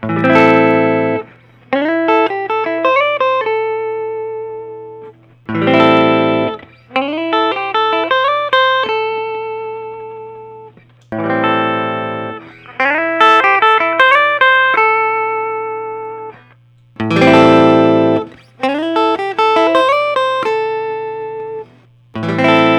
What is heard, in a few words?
parker fly select series; butter